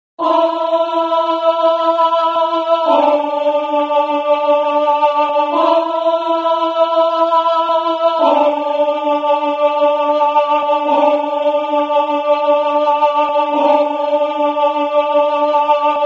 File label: alto